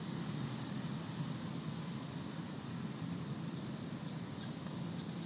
Ambi Loop, outdoor samples Ambience Loops

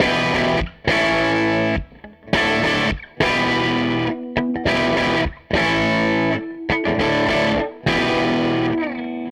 Gretsch Guitar Loop

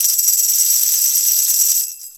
Roots Reggae; Sounds Good - Roots Reggae

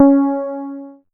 C#, PIANO; Big Fish Audio; Synclav FM

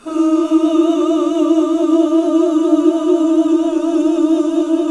Gosp. Oooh; Sound & Vision - Gigapack II CD 1 (Roland); HAL Gosp. Oooh 1

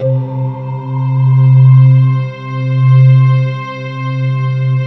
orient C3

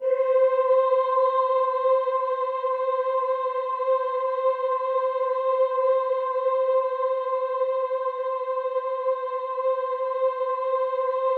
C5, Synth Leads

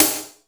MONDO SNR, METALIC DRM; Crypton - DrumBase5000; METALIC DRM2

